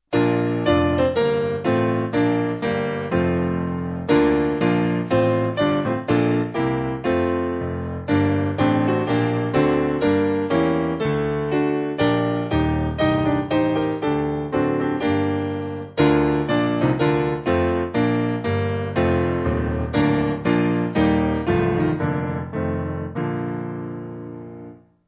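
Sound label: Piano, Music Backing Files for Churches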